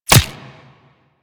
Player Bullet Impact Flesh; Warframe; Weapons

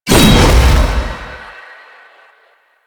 Dagger Proj Die; Duviri; Incarnon Adapters; Incarnon Okina Prime; Weapons

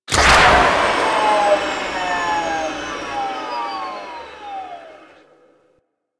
Skiff Engine Boost Medium Stop, Vehicles; Warframe; Grineer Skiff Player Vehicle

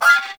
Melee Impact Metal; Warframe; Weapons

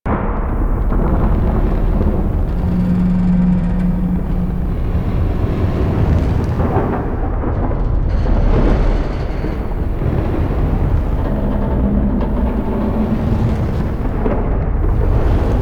Void Dragon Distant Loop; Warframe; Duviri

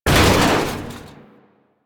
Internal Ship Damage; Warframe; DE; Vehicles; RailJack; RailJackHitEffects; Railjack Hull Hit Effects Internal

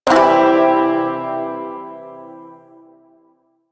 Shawzin Phrygian Chord A, Music; Chords

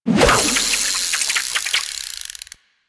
Fishing Spear Line Retract; Warframe; Weapons; Eidolon Fishing Spears

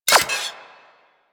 Sniper Rifle Mech; Warframe; Weapons; Corpus

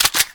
Pistol Reload Start; Weapons; Warframe; Tenno; Priest Pistol

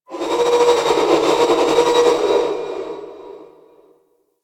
Asteroid Metal Groans And Scrapes; Ambience; Warframe; Grineer Asteroid

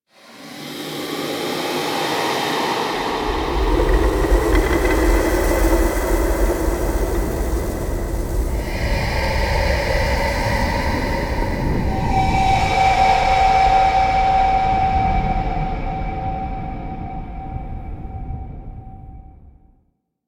Ghost Ship Emerges; Call Of The Tempestarii; Wraith Quest

